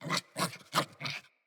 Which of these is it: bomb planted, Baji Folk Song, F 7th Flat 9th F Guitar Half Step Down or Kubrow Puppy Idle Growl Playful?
Kubrow Puppy Idle Growl Playful